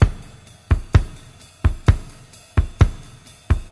129 bpm drum groove F sharp key, Free breakbeat sample - kick tuned to the F# note. Loudest frequency: 516Hz